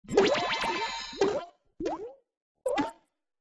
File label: schmooze; Toontown Online